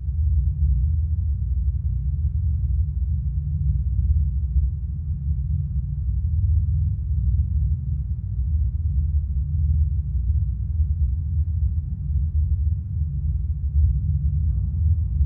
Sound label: Cave Ambience